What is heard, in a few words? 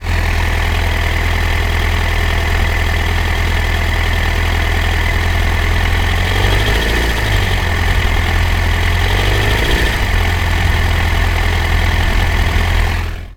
z1000 idle right side